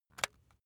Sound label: razor attachment extend